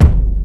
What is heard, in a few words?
Kick